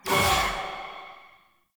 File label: Choir Erupt Proj Throw